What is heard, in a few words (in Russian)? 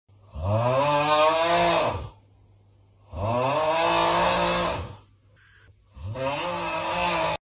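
мужик храпит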